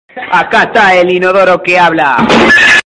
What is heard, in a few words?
toilet kick